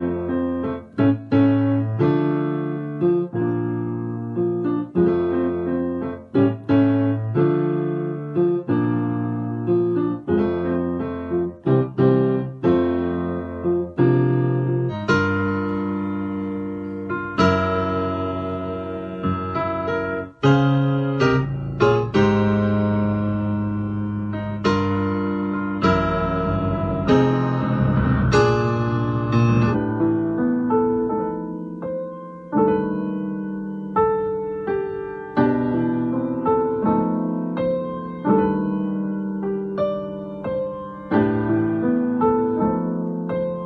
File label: Piano